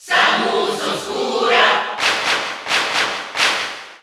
Cheer Italian; Dark Samus; SSBU

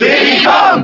Cheer German; Diddy Kong; SSBB

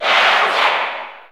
Cheer English; Bowser; SSBU

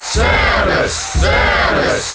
Cheer International; Samus; SSBM

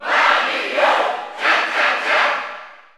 Cheer German; Wario; SSBU

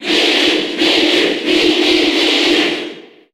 Cheer Japanese; Mii; SSBU

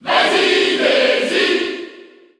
Cheer French; Daisy; SSBU

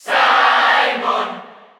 Cheer Spanish; SSBU

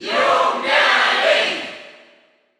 Cheer German; Young Link; SSBU

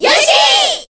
Cheer Korean; Yoshi; SSBB

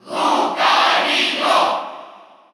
Cheer Russian; Lucario; SSBU

